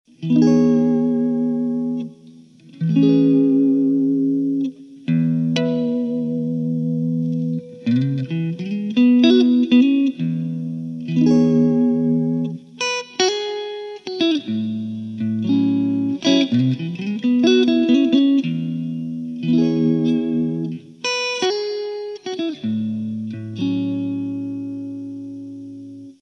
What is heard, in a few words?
Strat Clean RP100; StratClean RP100 rev